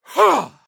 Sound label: Grunt Jump; Assassin; Sly Scoundrel; Realm Royale